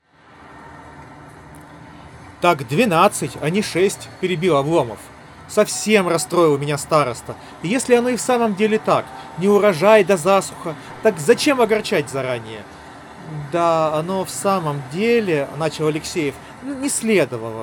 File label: Recorder noise; ugreen hitune h6 pro